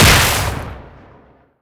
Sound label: headcrab reviver ranged impact layer; creatures